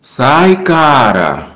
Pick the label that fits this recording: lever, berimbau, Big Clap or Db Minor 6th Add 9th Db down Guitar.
berimbau